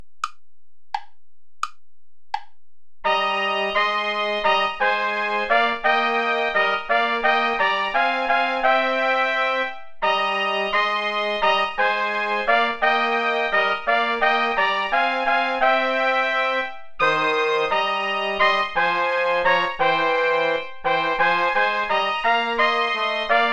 ich will solang ich lebe play along without trombone; schutz heinrich